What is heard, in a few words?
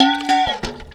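WASHEE BE, percussion metallic; Rarefaction; Poke in The Ear With A Sharp Stick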